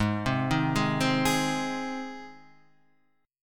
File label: Ab Major, down; arpeggiated; JGuitar